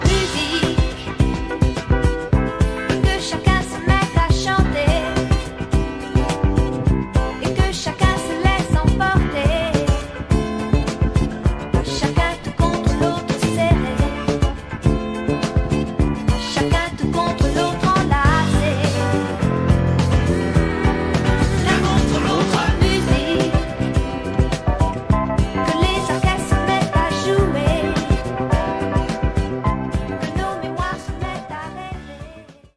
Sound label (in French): sonnerie